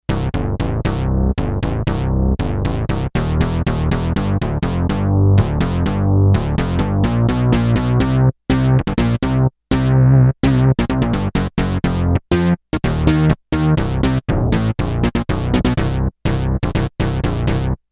Motown Bs, Kawai K5000S factory presets

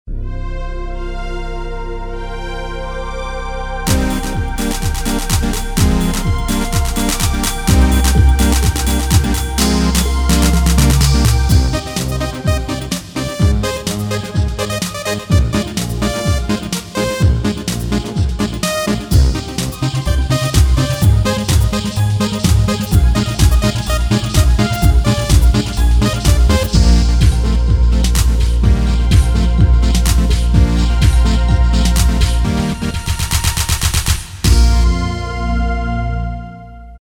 Club Pop, Casio CTK-601 Rhythms

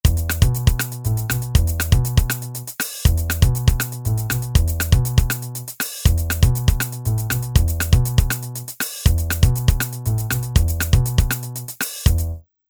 Pop

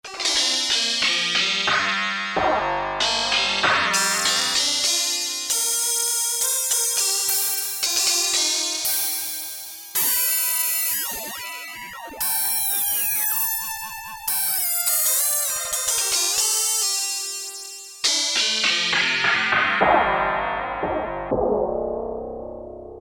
Wavola 2, Roland W-30; Factory B

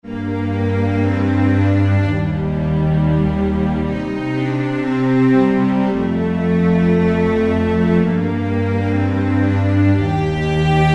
Vcs Legato, Roland SR-JV80-02 Orchestral

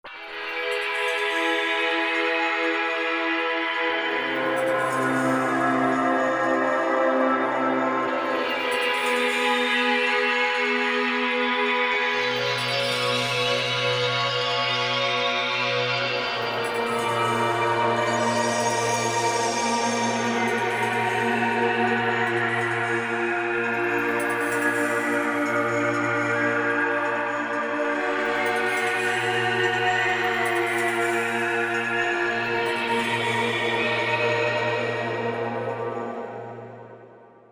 Se Pad, Korg MSC-14S; Korg MSC-14S Sound Effects

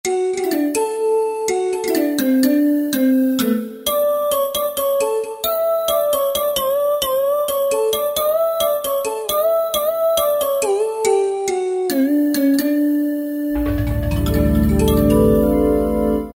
Perc Pan Lead

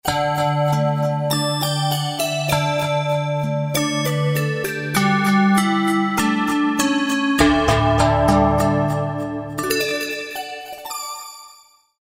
JD990 Mix Bell; Factory Patches

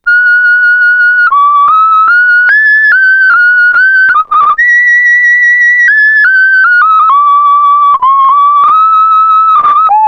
Song Whistle, Roland SH-2000

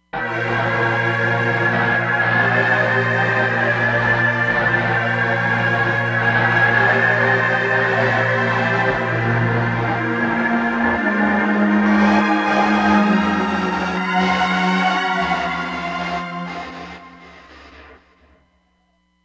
Seq-Pad, Roland Fantom XR

